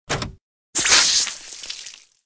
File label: squirt flower squirt